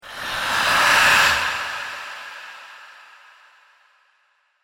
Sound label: Ghostly Breath Transition; Stingers; Sound Design